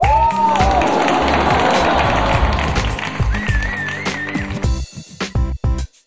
Correct Wrong; Dinox; Wii U

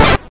Mini Bomb; puchicon; dsi ds

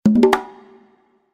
African Notification; Nephenthesys; PS4 PS5 switch windows xbox one xbox series xs

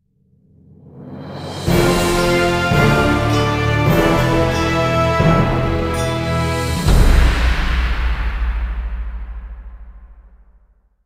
Death Music; Henry Hatsworth in the Puzzling Adventure; 2009 nds gamerip; Banson

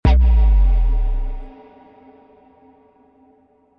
clickin; Ambient Clicks

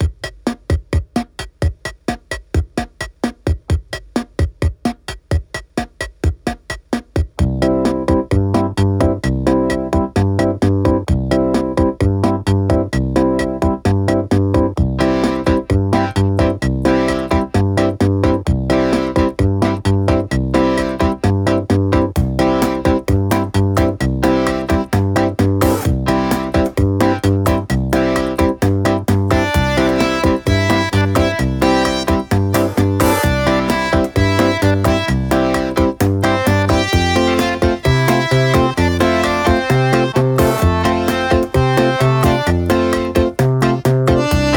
CASIO World one Music; Wunderling

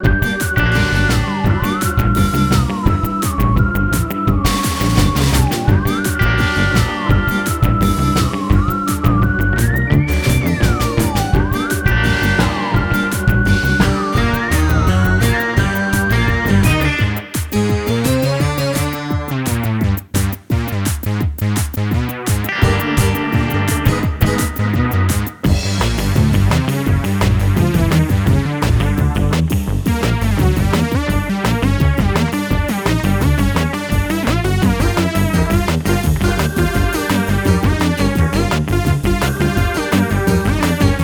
Combat Med loop; GraveyardOps; Plants vs. Zombies Battle for Neighborville

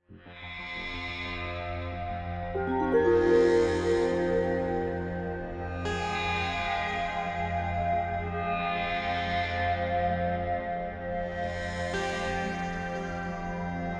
Dialogs Calm, soundtrack; Auto Age Standoff

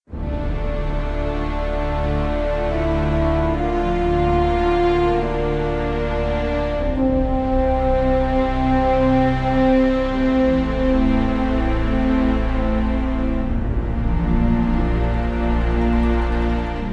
bgm map background; devils demons android game music

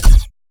Sci-Fi Weapons Laser Shot; Source Sounds

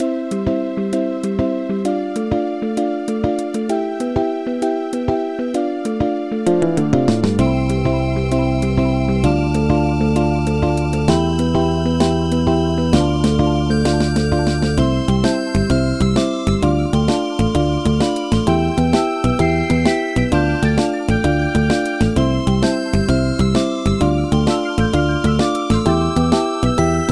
Pause Menu; the legendary starfy original soundtrack 2018

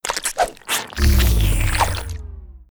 sfx leech; Animation Throwdown

